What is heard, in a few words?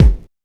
perfect steel kick drum sound f sharp key